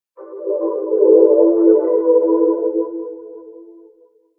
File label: in Veil, Vocoder